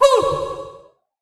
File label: Attack Vocals; Swordcaster Old